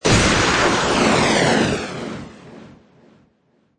Magical Projectile Launch; Magic sound of casting spell, conjuring or wizardry. Witchcraft ritual summoning elemental or otherwo; Magic Sound Effects

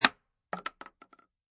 Bullet Shell Drop Shotgun 12Ga Solid Wood; Bullet shell drop, Shotgun: empty Shotgun 12 Gauge shell falls on solid wood; Impact; Lynne Music

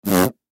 Mouthpiece Fart, Mouthpiece Fart Short; Cartoon; Musical; Cartoons; Blastwave

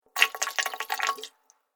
Water Fast Little Bubbles; Splish, Splash, Splosh!

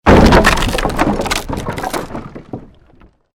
created wooden structure crash medium; Series of dramatic events based on wooden smashes and collapses; Crash Sound Effects

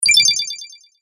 Sparkle Bright Elf Magic Spell; Magic Sound Effects

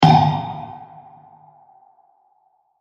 Short Metal Stab With Long Reverb; Bursts; Impacts; CraftedSignals

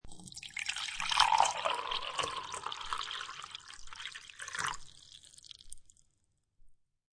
Pour Coffee Into A Coffee Mug; Pouring

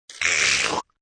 Weird Slrp, Weird slurping sound; Slurping Sound Effects; Human

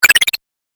8 Bit Style Drink Boost; Video Game Sound Effects

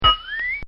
Cartoon Sound Effects Ping; Cartoon Sound Design; Bobby Cole

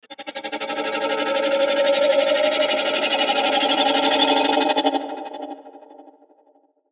Chopper Long Delay Reverb; Blastwave; Chopper Sound Effects

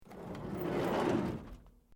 Car van old door sliding open fast; various vehicle sounds

